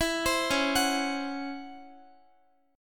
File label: Db Minor Major 11th Db Guitar; DbmM11 arpeggiated; JGuitar